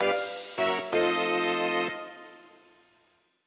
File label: Diegetic Music Amusements Ring Of Fire Fail; lego horizon adventures